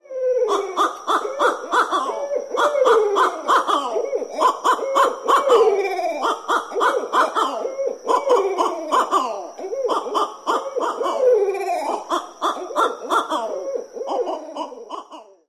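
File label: Barred Owl pair hootin it up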